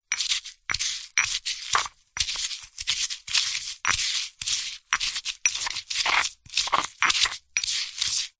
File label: food herbs leaves grinding in stone pestle and mortar; zapsplat